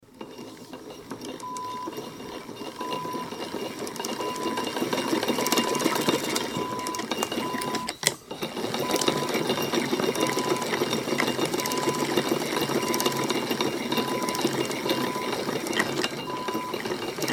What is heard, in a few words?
Old Singer Sewing Fast